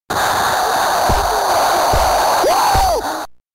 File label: crowd roar